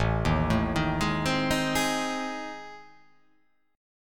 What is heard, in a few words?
A Minor Major 7th Flat 5th